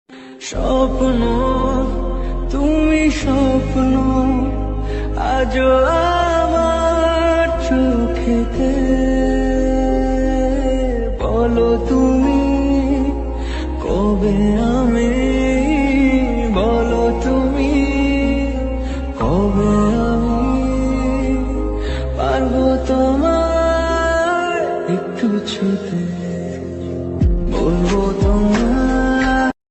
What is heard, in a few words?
bengali ringtone